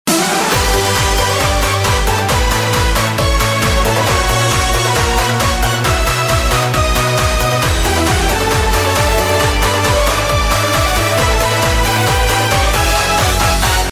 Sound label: intro music; freak fortress 2; cyber ninja scout